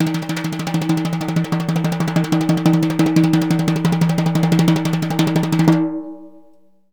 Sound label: Synclavier Percussion, World & Orchestral; Synclavier Percussion 2; ILIO